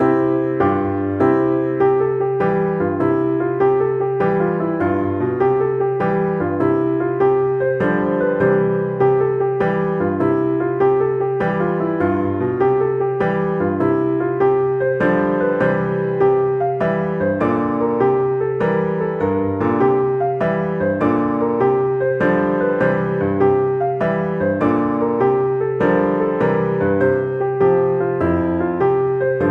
jig

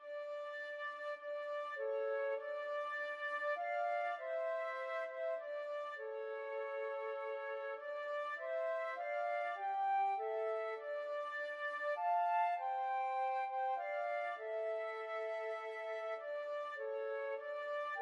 coventry carol, flute duet

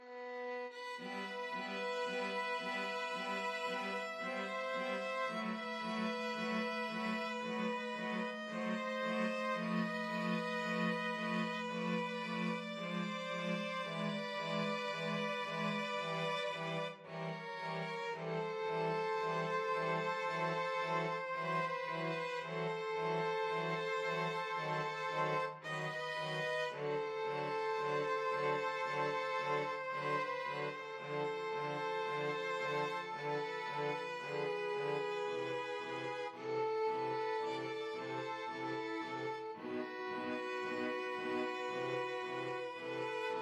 prelude, string quartet; chopin

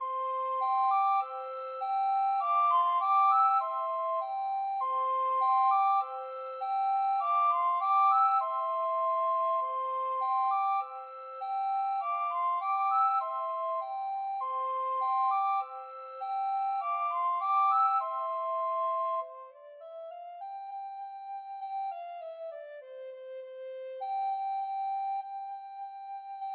recorder duet

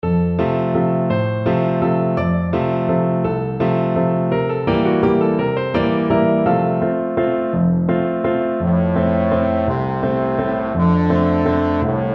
trombone

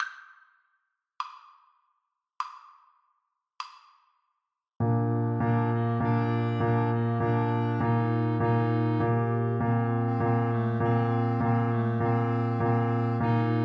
Clarinet Duet

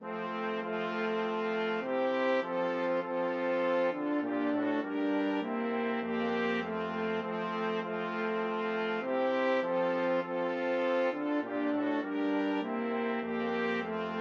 christmas tree BRASS quartet

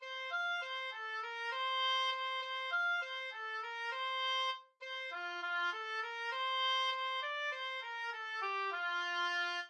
pin pon, oboe

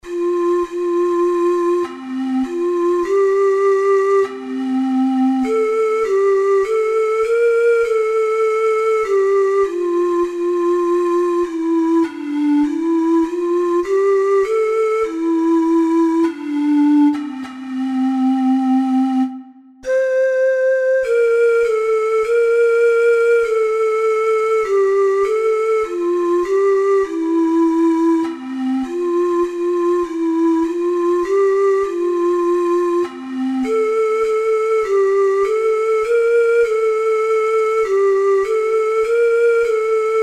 o come, ocarina four hole

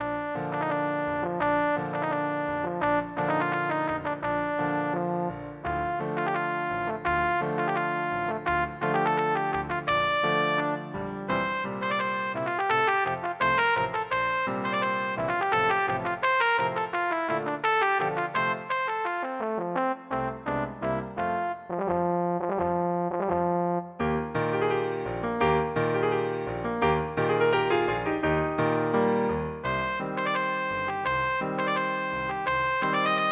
bruch violin

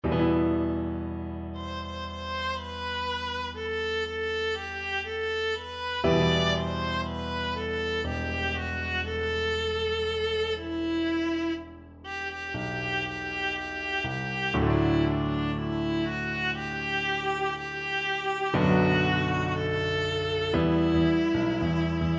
viola

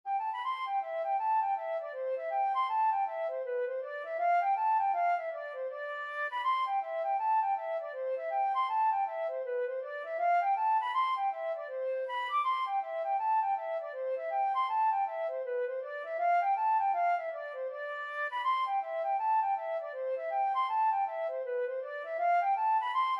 last of twins flute

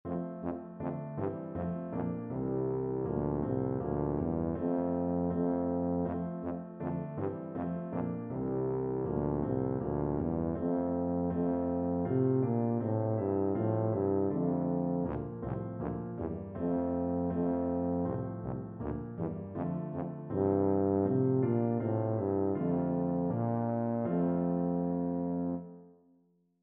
good king, tuba

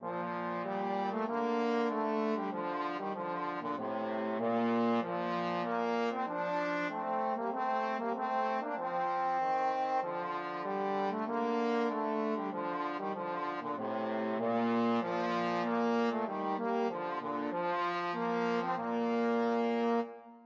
trombone duet

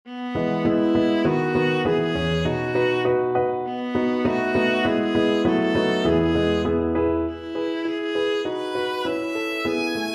galliard sonata, viola

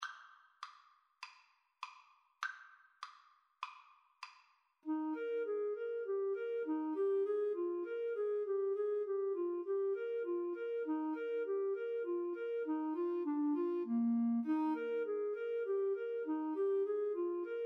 melody, Flute-Clarinet Duet

